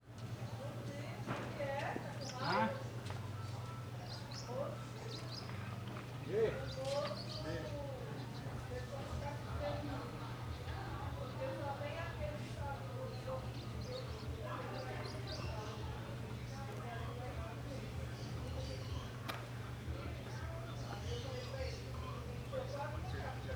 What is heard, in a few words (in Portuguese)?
Rua comercial de cidade de São Jorge